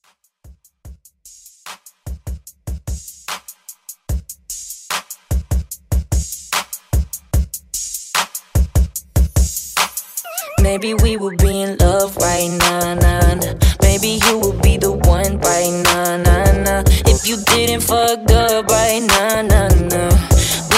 2020s Pop Re Drum 148 BPM

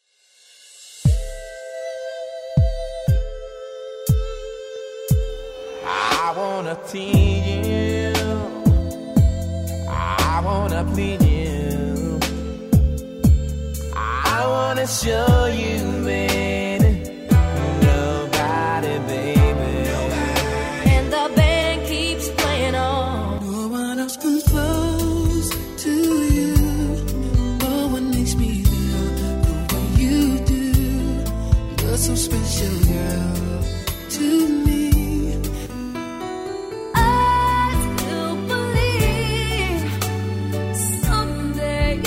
rnb slow jamz

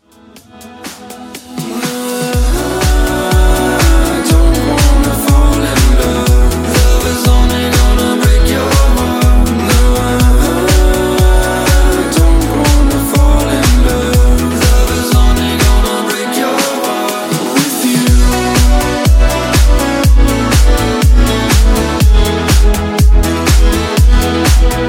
Funky House Intro; Remixfreakz; Redrum; remixplanet

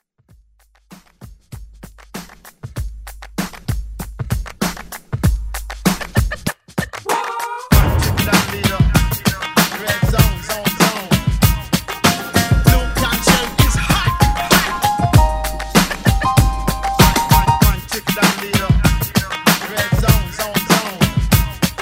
Throwback Hype Quick

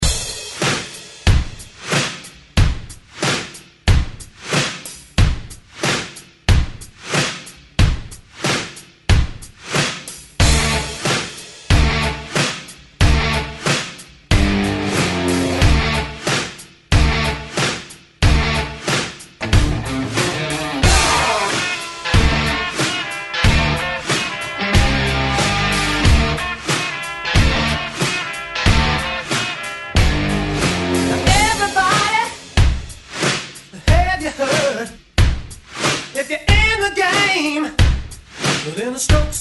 Stroke Rock ReDrum 92Bpm; DJDOLLS

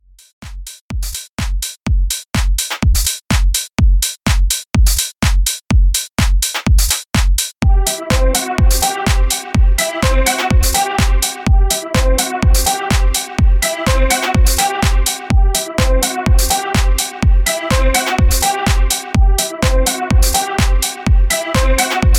Club Extended Mix 125Bpm

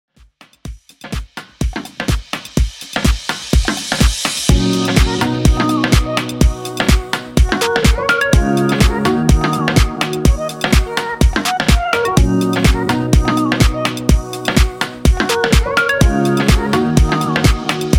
Top40 Club Workout 125 Bpm

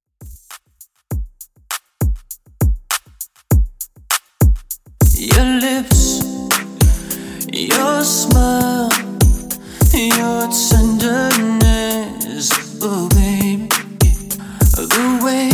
90s Soul Redrum 100 Bpm

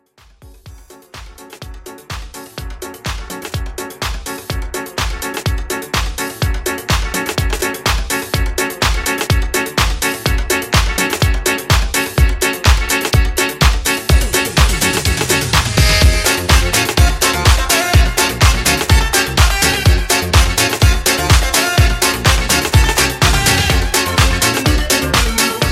80s Club Mix 125 BPM

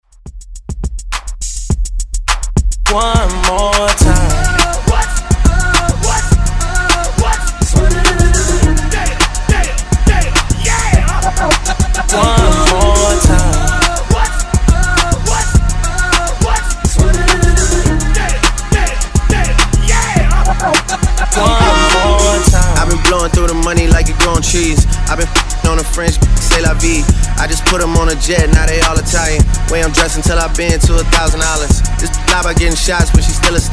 Hype Redrum

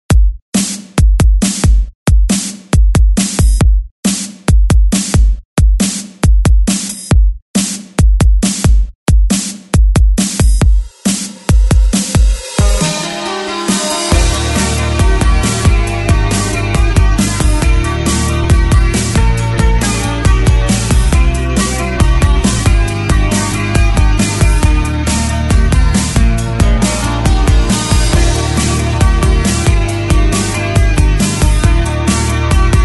Remix 137 BPM

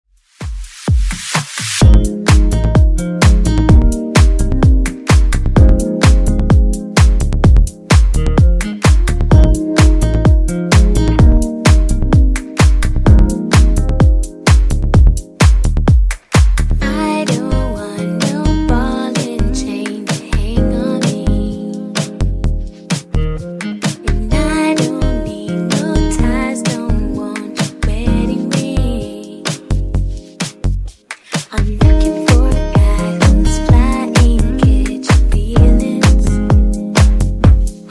Funk Nudisco, 128Bpm

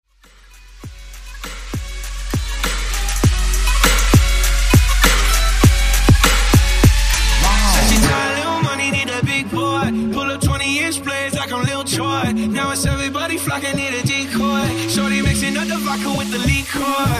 Top40 Twerk Bootleg

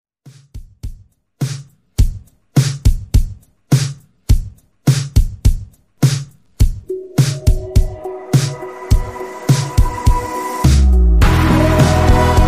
Mixshow 104 BPM

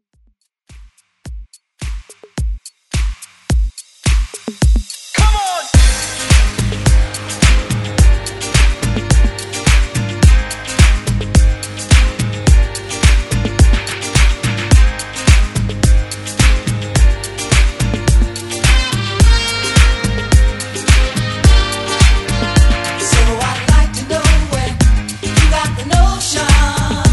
70s Redrum

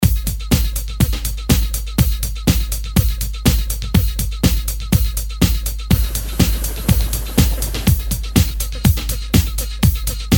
70s ReDrum 122 Bpm

